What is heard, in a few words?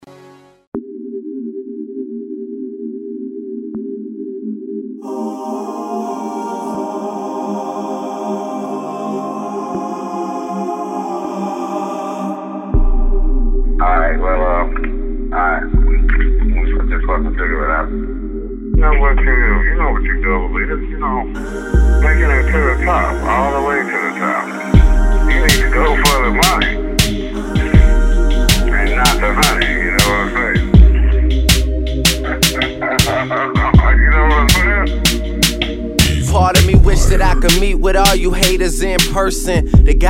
unreleased verse